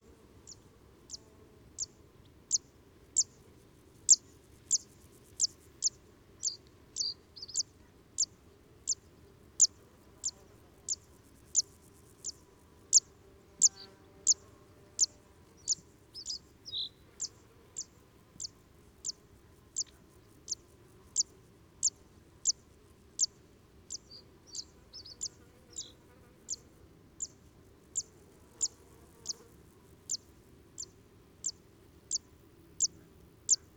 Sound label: Song of a juvenile male